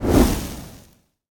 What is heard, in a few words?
fireball; smite; pele